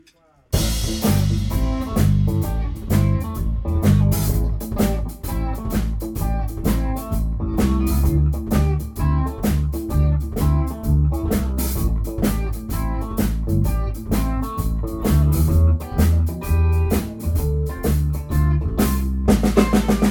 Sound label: Blues ending